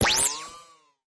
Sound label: Sharp Ricco Razor Sweep, Taylor Grand